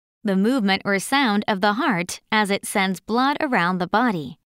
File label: heartbeat